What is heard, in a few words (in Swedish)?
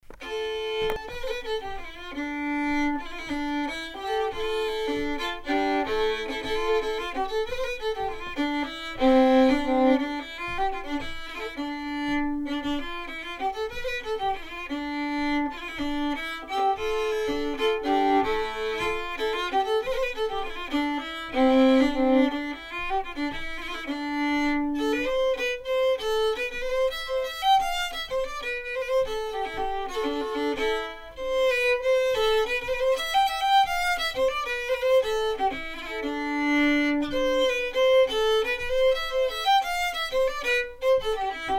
Stämma Hambreus polska